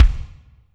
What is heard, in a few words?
BD, SR16 drums; 90 s Sample CDs; Best Service